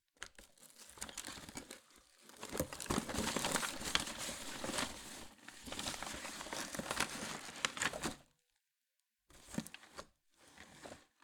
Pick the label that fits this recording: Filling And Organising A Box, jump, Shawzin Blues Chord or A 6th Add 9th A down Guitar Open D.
Filling And Organising A Box